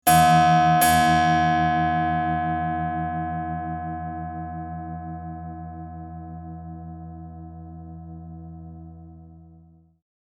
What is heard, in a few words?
Pluck Linear Resonator; simulations